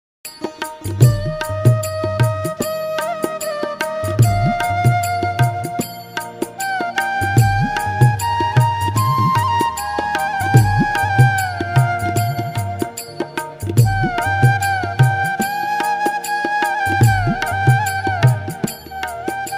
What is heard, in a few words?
hindi instrumental ringtone bhajan ringtone bhakti ringtone